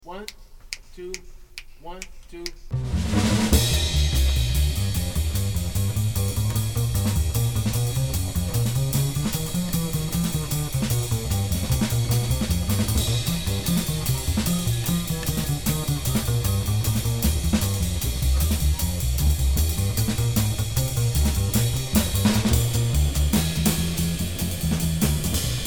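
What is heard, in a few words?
bass drums only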